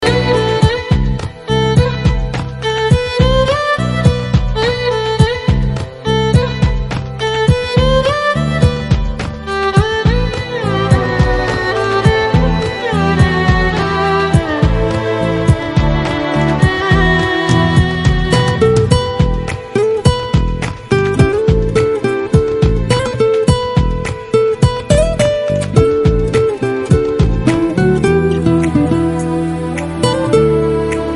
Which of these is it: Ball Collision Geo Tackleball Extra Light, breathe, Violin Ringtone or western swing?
Violin Ringtone